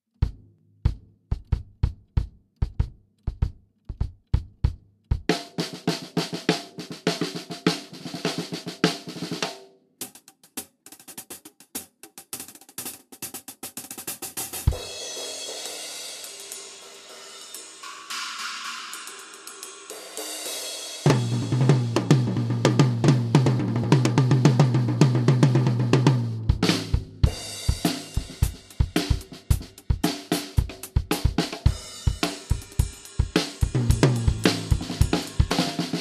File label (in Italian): Mix, batteria